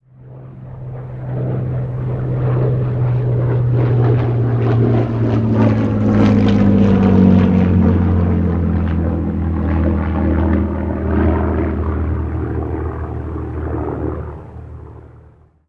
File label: plane